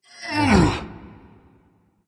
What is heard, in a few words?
grunt; smite; geb